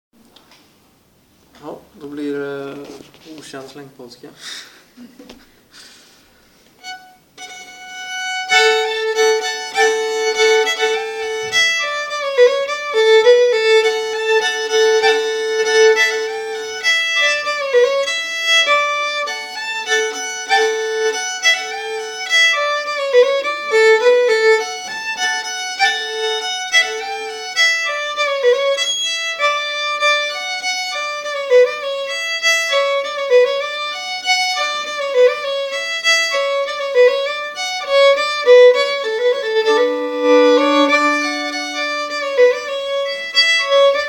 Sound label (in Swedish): Polska; Polska efter Pelle Pettersson